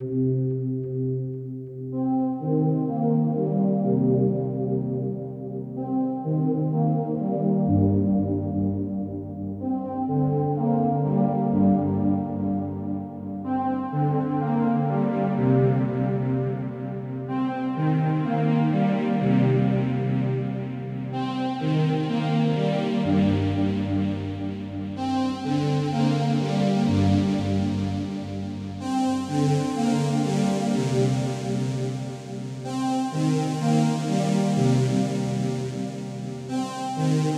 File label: Music from noise